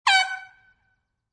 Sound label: Fog Horn Boat Short; Vehicles